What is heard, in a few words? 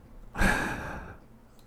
inhale